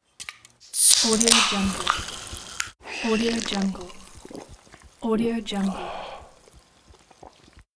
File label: opening beverage can and drink refreshment